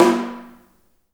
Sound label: HAIRCUTS, KENYA DRM, Heart of Africa